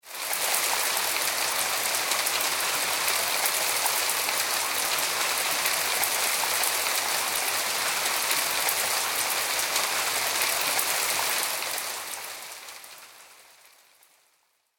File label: weather rain med street trees, Rain (Medium) On Trees And Hard Surface; Rain ( Medium ) Falling On Leafy Trees And Hard Surface